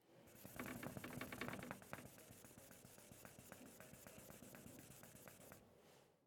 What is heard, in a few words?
White Board Marker Drawing Fast